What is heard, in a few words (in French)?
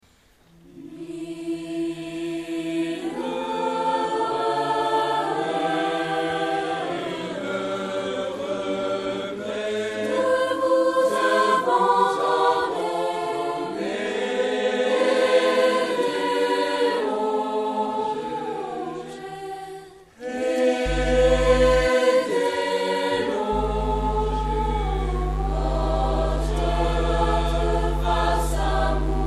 josquin mille regrets concert; live au miroirs